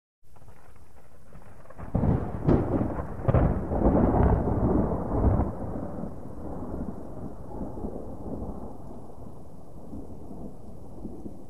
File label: Thunder Medium Distant